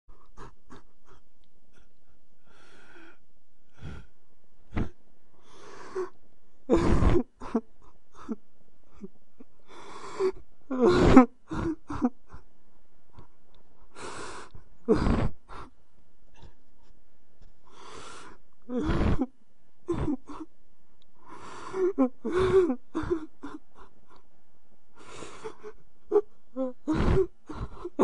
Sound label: woman crying